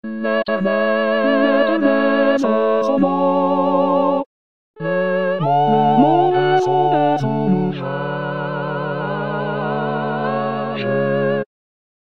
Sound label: Tutti; Liszt; L'Eternel Est Son Nom